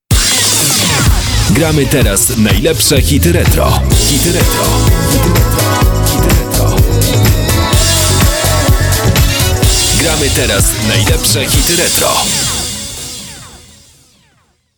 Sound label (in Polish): Jingiel Hity Retro